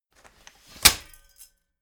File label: folding chair unfold fast